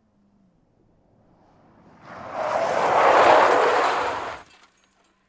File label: Sm Car 180 Skid, compact